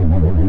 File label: phasemissile; Tanks